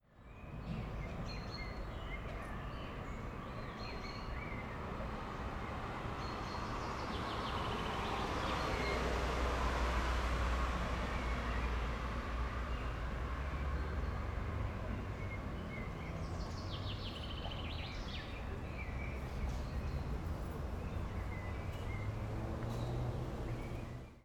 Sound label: Blue Yeti Stereo Nature